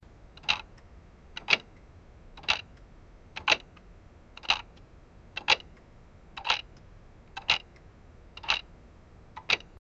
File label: tick tock old slow clock; vintage